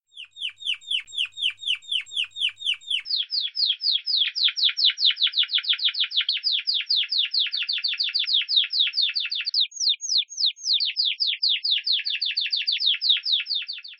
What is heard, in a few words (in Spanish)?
Piau Piau, timbrado español; θετικές νότες